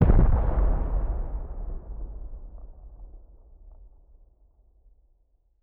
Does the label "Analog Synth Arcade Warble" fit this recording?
No